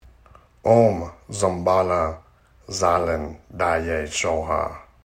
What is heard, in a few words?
Yellow Dzambhala Mantra